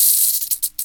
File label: rattle, headcrab poison; hl2